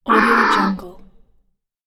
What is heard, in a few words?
creature voice